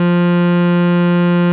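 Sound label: F2 chamber, pro 600 wave; AKAI S-Series CD-ROM Sound Library VOL-7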